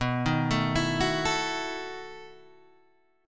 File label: B 11th B Guitar Open G, chord